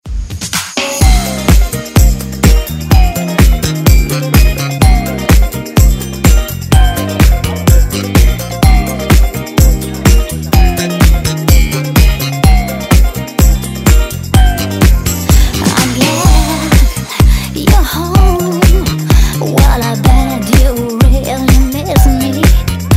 Dance Redrum

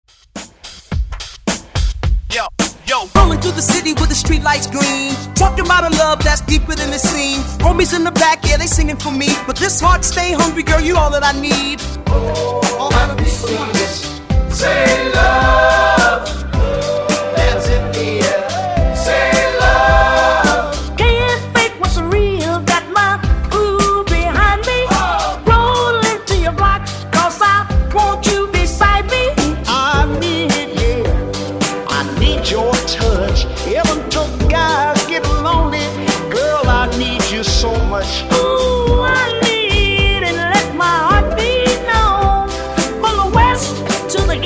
60s Re Drum 107Bpm; DJDOLLS

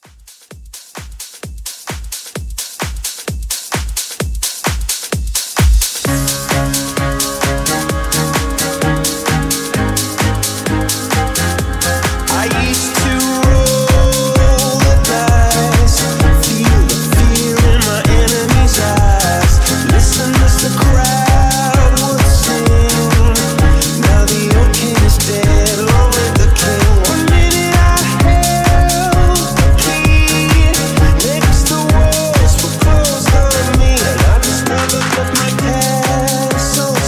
House Re Drum

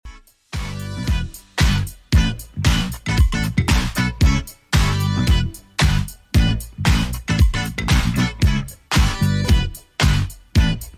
80s Redrum 114 bpm

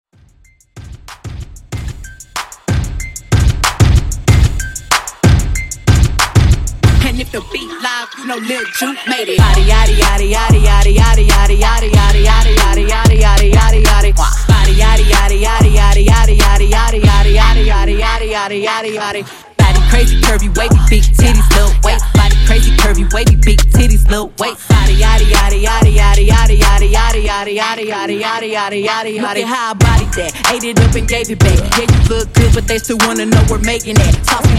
Top40 Mashup Dirty

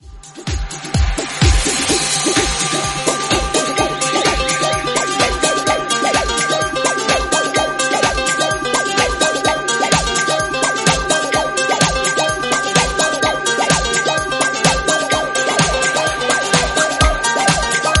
127bpm Gm

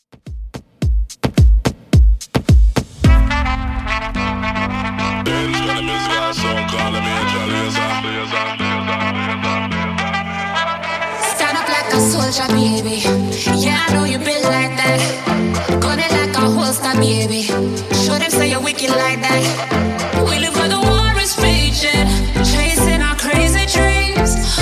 2ks Redrum 108bpm

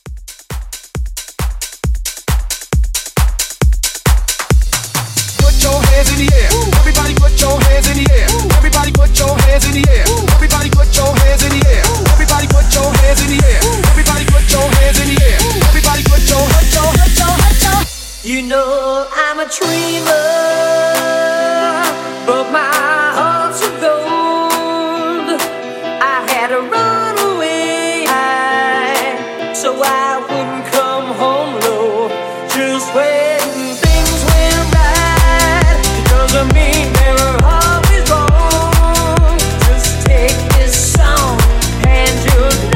House Mixshow

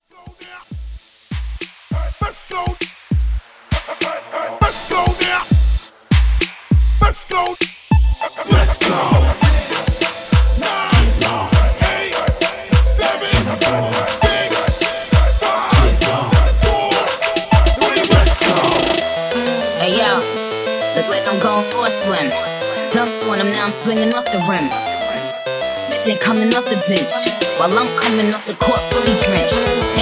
Twerk Mashup; Remixfreakz

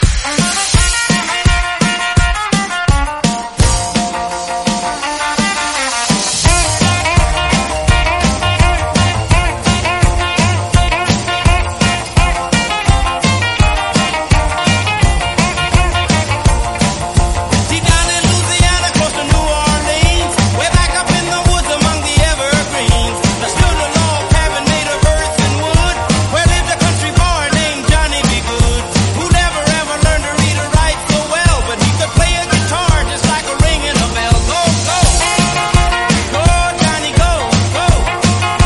168bpm F; 1958

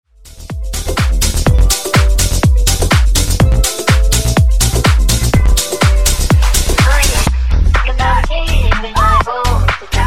Funk Edit 124Bpm

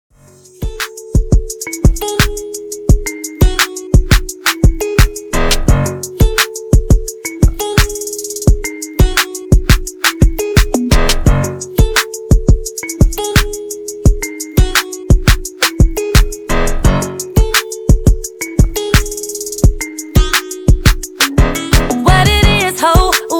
Extended 86 Bpm

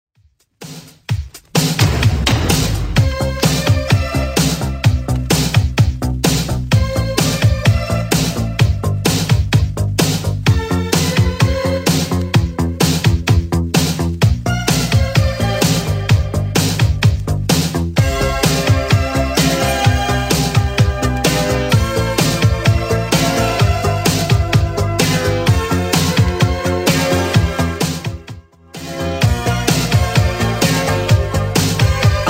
80s Redrum 128bpm

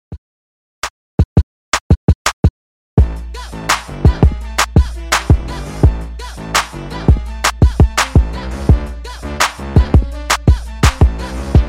Clean n Dirty

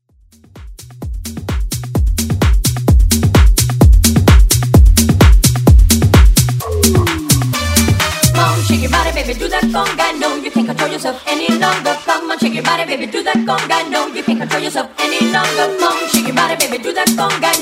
Tribal House

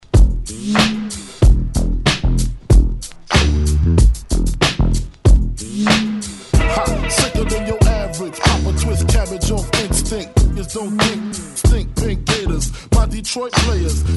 90s Intro 94 Bpm; DJDOLLS

